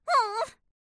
sigh; amy rose

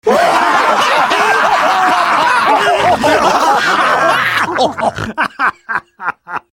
laughing at you at once; all of tf2

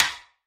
perc

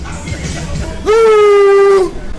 oooh

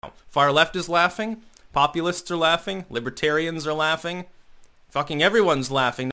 everyones laughing; styxhexenhammer666

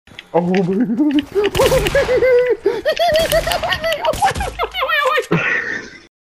walking noises

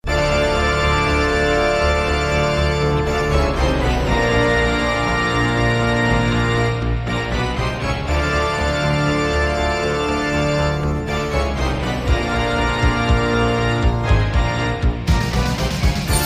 organ; phantom of the opera

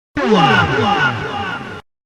death sound effect; megaman x4; zero

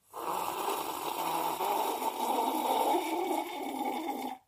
slurp

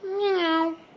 meow

